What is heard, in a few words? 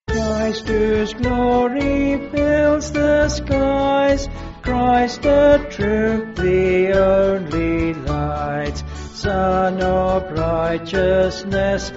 Christ Whose Glory Fills The Skies, Ratisbon